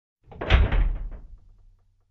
Steel Door close slam

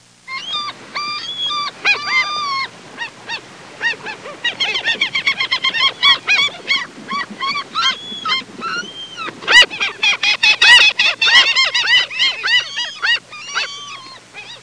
Seagulls sounds

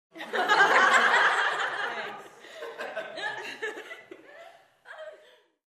Gathered Teenagers Laughing

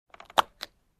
Camera Lens Shutter Sound

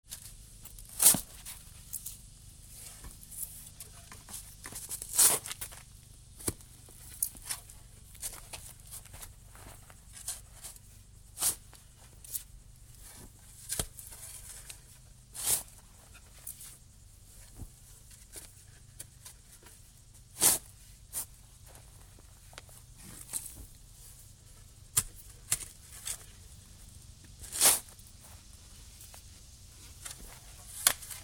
Camping sounds

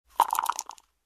Dice Shuffling

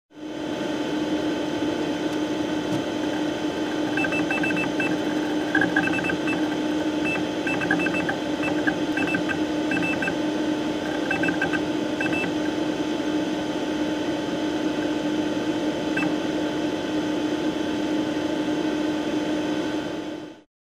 Console running with beeping sound

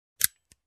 Gun Clicking Sound

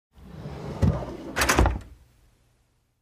Closing big drawer

